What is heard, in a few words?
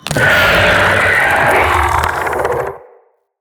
Spider Vocal Attack; Vocals; Root Spider Mother